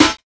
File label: snare high hard one; Sonic Foundry (Sony Creative Software); Ugly Remnants VOL-2; Beatstuff